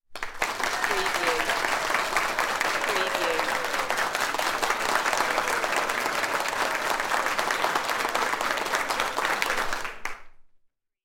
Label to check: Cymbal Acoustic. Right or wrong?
Wrong